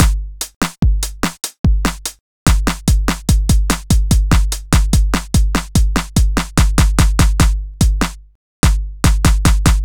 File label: Groove Pizza 73bpm